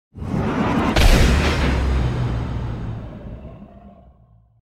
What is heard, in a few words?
Huge Door with Growl; Hollywood Edge Sound Designers Tool Kit